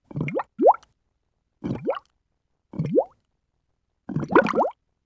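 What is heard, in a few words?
water bubble; EnvSound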